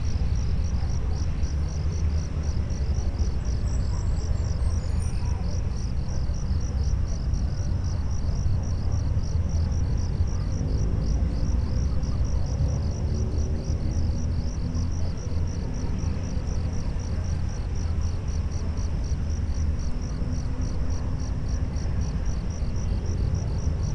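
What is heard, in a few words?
Cricket ambience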